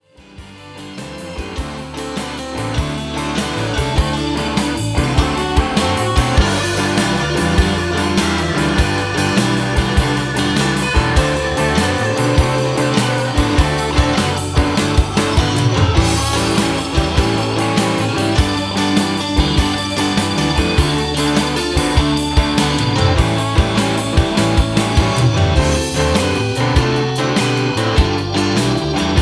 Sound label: South Side Blues in D